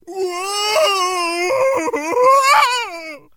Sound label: crying male; ooh ahh; human